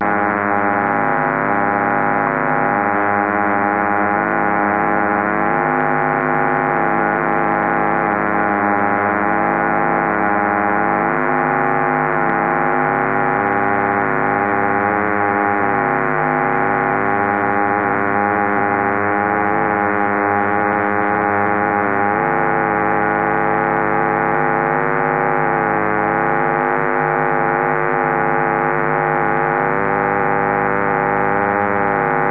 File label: perturb 77.5KHz, dcf77 vlf; Perturbatii LF